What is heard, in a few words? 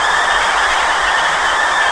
concrete skid wet